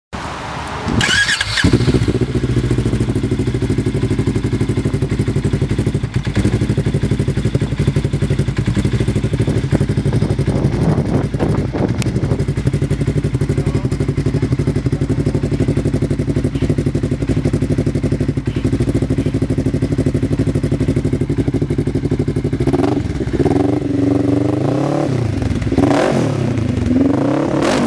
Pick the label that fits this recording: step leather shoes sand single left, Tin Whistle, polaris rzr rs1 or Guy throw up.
polaris rzr rs1